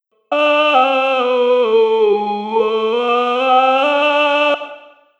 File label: alto Bruite